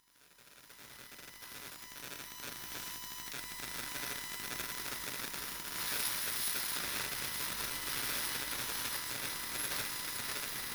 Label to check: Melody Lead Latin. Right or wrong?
Wrong